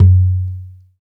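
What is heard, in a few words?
conga, African Perc; Spectrasonics; Supreme Beats; African Contemporary; SB Afro DRMs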